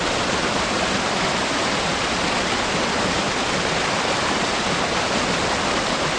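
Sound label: ambience med waterfall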